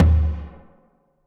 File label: Tom Acoustic Crush Lo Verb